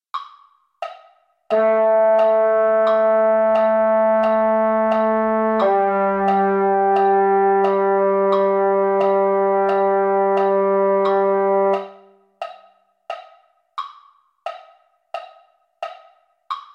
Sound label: mellophones; holiday brass